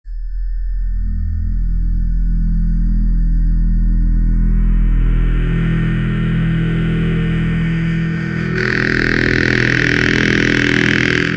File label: robotic arm s; animated; fizzarolli